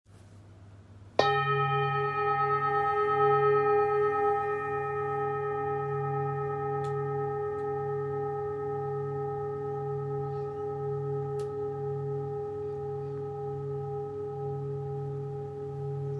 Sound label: bowl, singing bowl; 22 cm; Polished Harmony Bowls